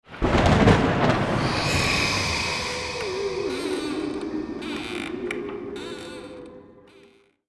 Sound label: Halloween spooky whoosh transition